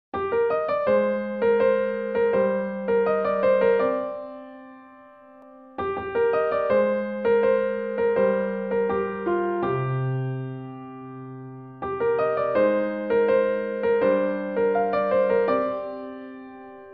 ringtone piano instrumental

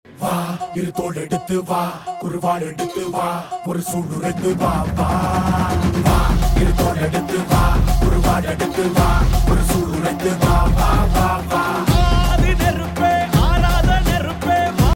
kanguva; disa patani; bobby deol

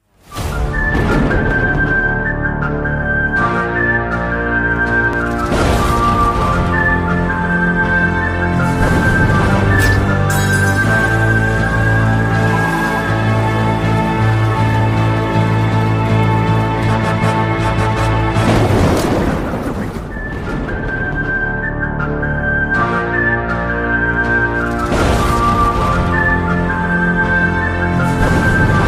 maveeran bgm ringtone mass bgm ringtone

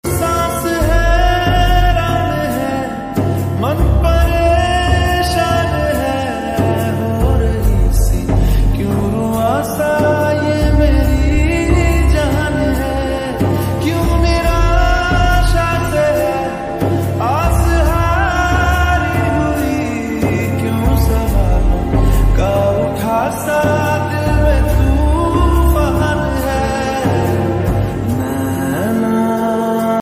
remix ringtone

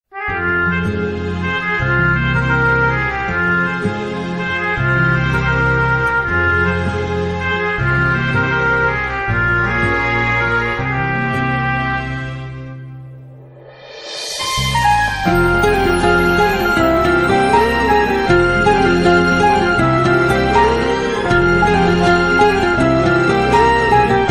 love bgm; ethir neechal

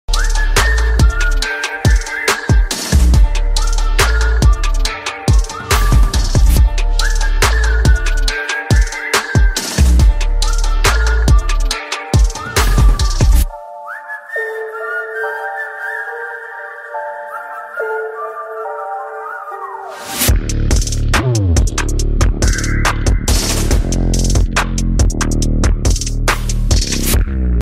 bgm ringtone whistle theme bgm; valimai

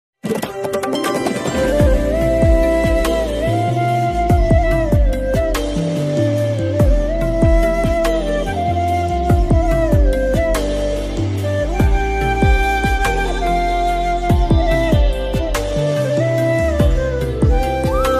ost ringtone pakistani drama; jaan nisar drama

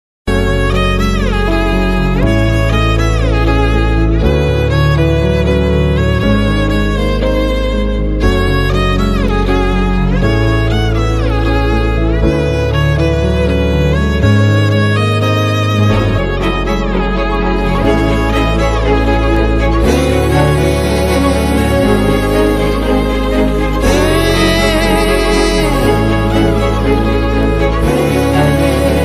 Sad BGM Ringtone music; lover movie bgm

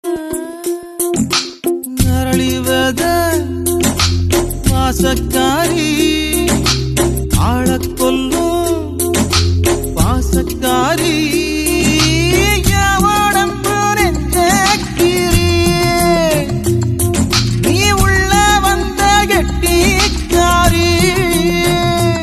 song, tamil love; paruthiveeran

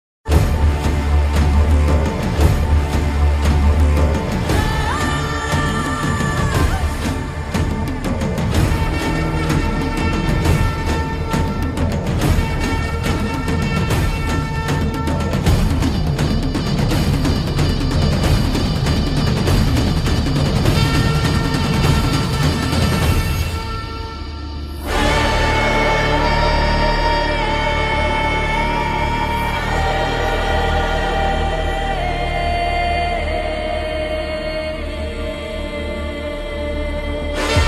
title bgm movie ringtones

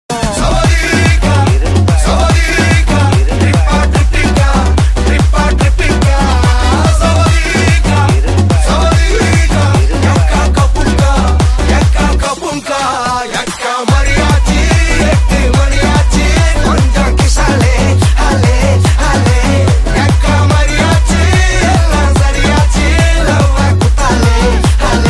song ringtone tamil ringtone; vidaamuyarchi

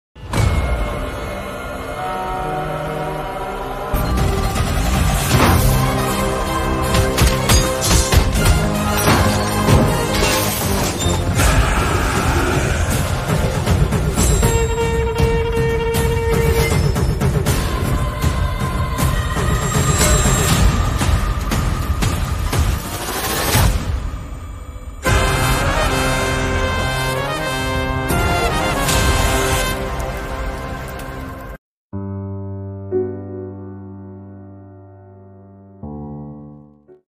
glimpse bgm ringtone; rudhran; raghava lawrence; sarath kumar

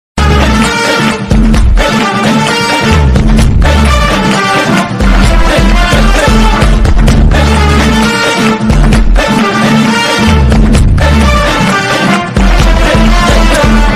mass bgm ringtone; ayan; surya

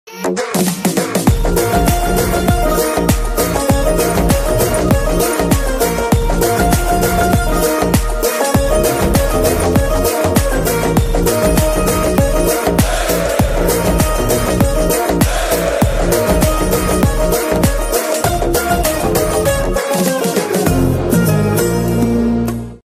intro bgm ringtone telugu ringtone; guntur kaaram

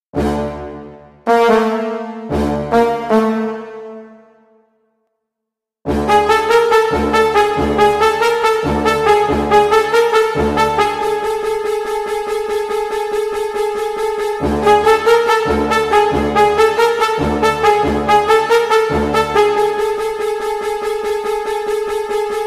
chase fight scene bgm cover; ayan

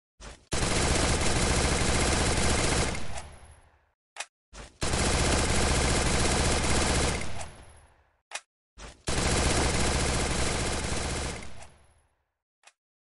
mp40 gun sound; ringtone; free fire

